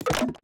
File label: foley; bespoke - Floral v.2